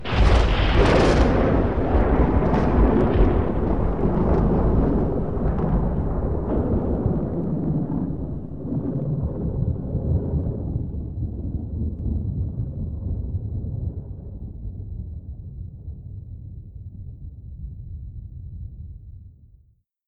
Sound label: Tenebrae thunder